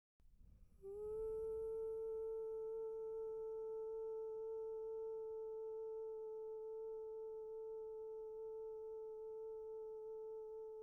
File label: humming